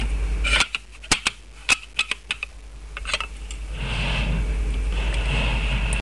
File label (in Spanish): golpes